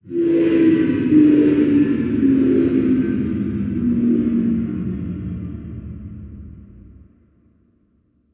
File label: shrink cast